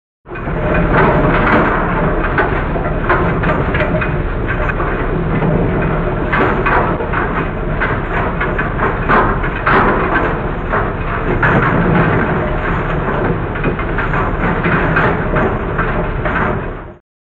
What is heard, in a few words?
Conveyor Rumble Meta, conveyor belt